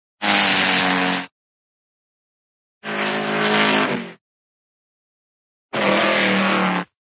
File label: auto, cartoon auto whizz by, zoom